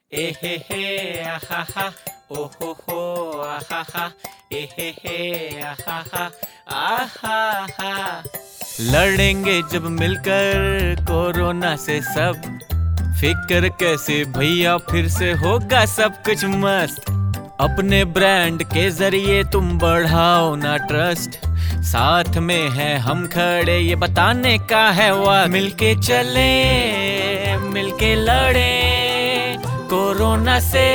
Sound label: musical